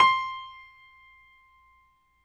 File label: PNO, Grand Pno; Club-50 - Foundations Roland